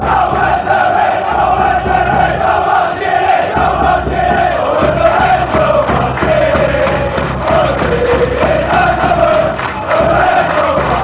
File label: reds go marching fanchants; drfc